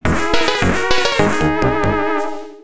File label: Jingle; adlib; Colgate